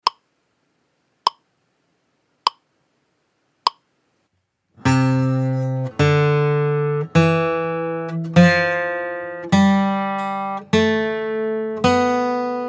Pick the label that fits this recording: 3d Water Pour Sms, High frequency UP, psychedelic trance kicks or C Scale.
C Scale